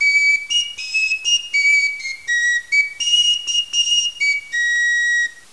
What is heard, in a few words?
Flute; Aarhus